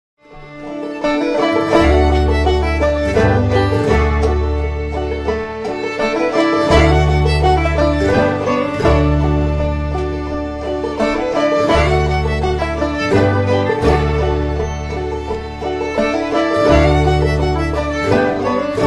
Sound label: Kids Music